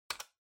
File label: Light Switch Selector Button Dry Mid